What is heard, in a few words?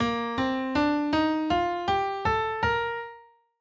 Major Scale Bb